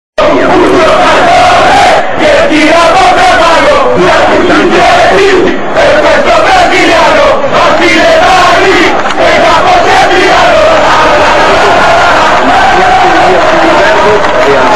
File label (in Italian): coro